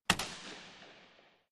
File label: Hockey Puck Hits